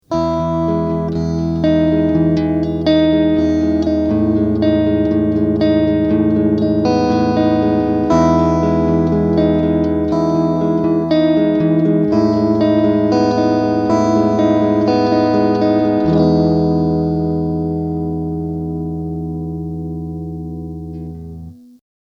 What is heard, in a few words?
pure clean